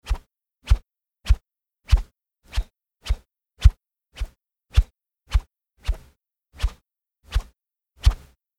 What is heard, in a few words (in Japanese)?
syuwan